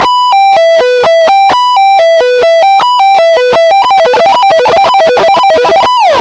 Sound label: E minor 3 String 2nd Inversion, Sweep Picking